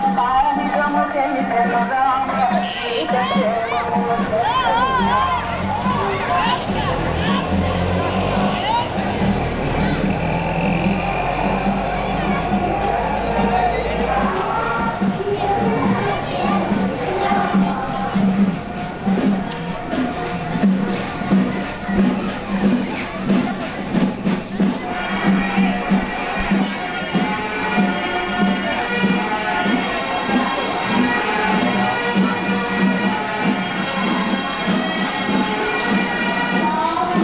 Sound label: Bullock Cart Procession Puttaparthi